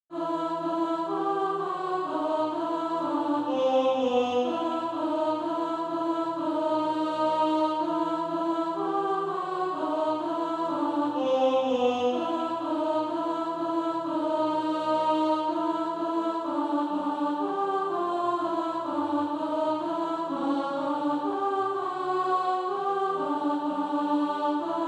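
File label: alto, SATB Practise Music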